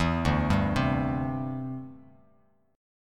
D 7th Suspended 2nd Suspended 4th D, Bass Standard; D7sus2sus4 arpeggiated